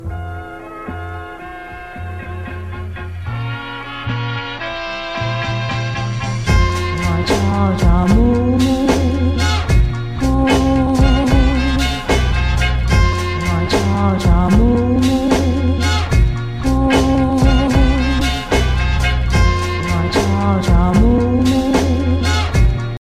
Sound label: 1940 Woman Soul Vibe